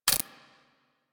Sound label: Briefcase Akimbo Pistol Mech Low Clip; Warframe; Weapons; Corpus